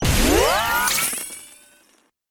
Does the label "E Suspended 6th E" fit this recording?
No